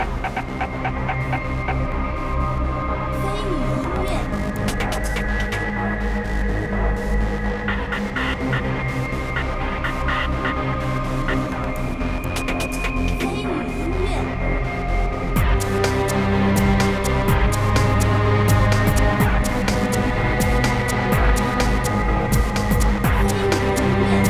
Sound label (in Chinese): 空间站